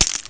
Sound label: chip pot; VEGAS Girls